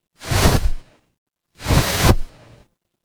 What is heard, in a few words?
transition swoosh